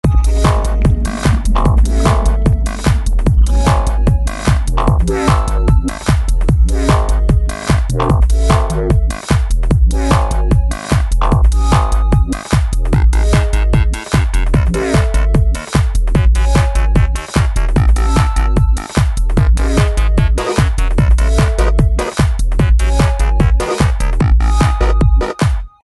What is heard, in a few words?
Technichaos - Loop; Chaos Faction 2